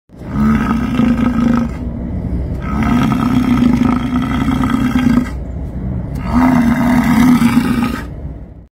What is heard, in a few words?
European bison; ویسنت